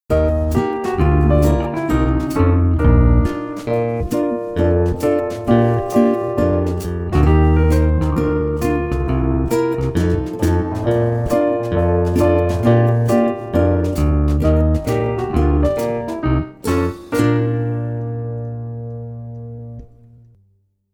saxophone; mib

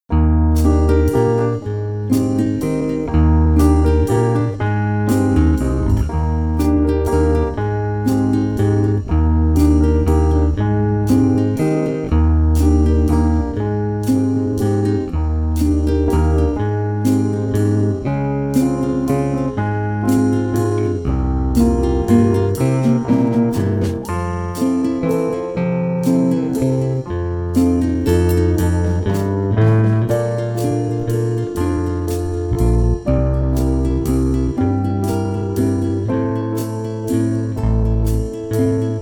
saxophone; mib

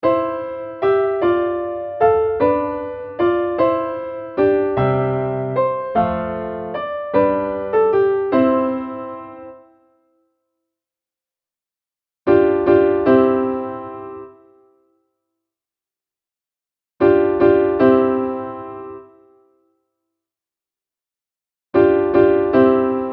barcarolle hilling acct ut 76, basson

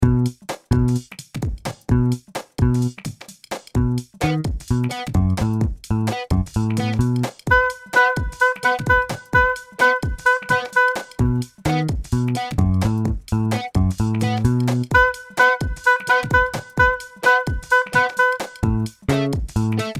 PAD solo

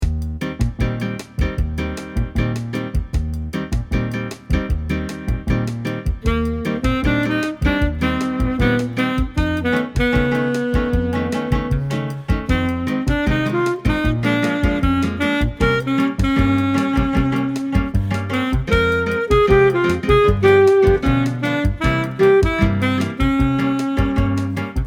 sax

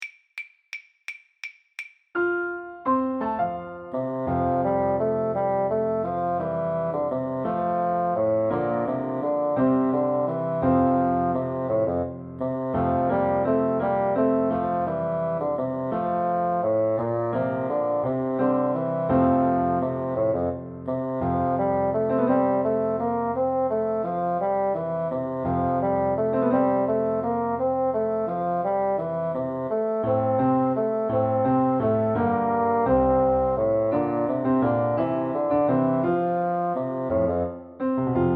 the maiden blush piano basson

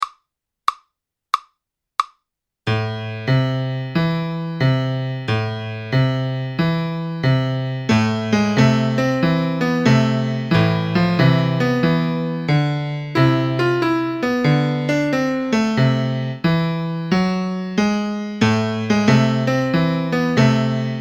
blues 2 bassons 2 voix

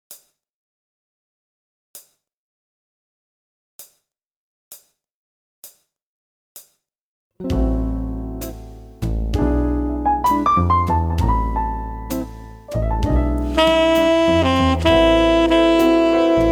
sax

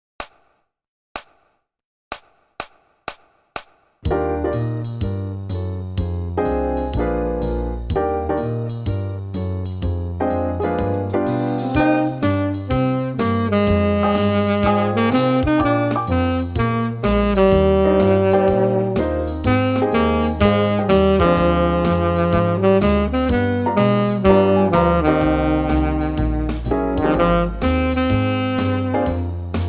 sax grave

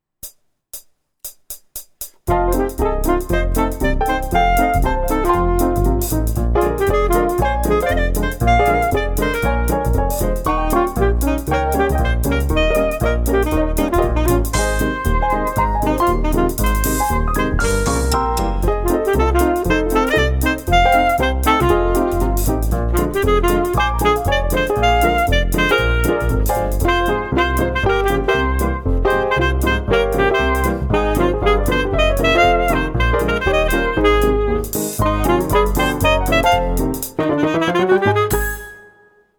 sax

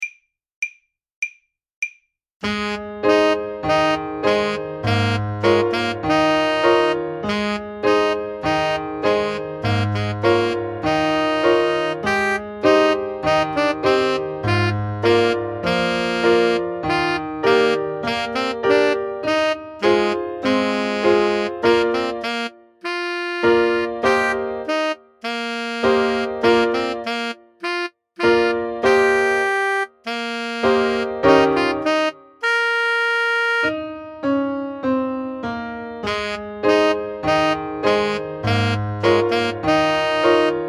danse clown sax

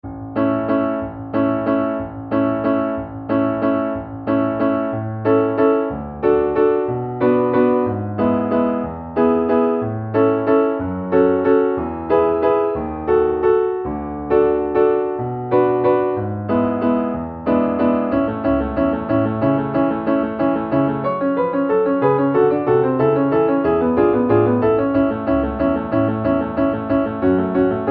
berlioz acct rapide, basson

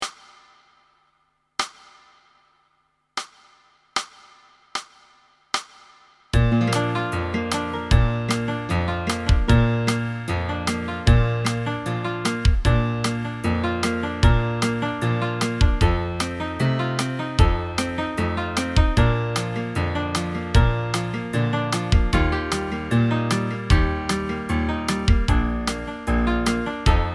saints sax; when the saints

